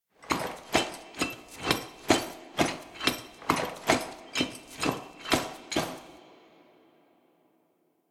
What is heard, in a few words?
walk sideshuffle